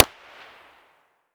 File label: pb far; Escape from Tarkov